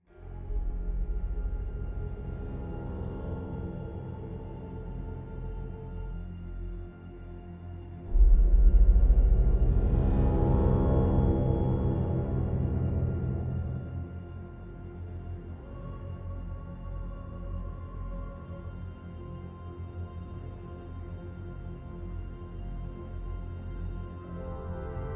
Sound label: Orchestra; ProScores